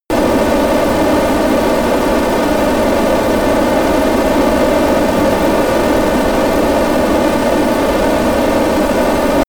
military jet eurofighter typhoon turbine full power close; flugzeuge jets; verkehr transport